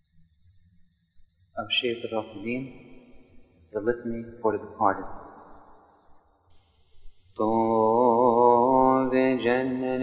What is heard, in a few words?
departed coptic; Altar Responses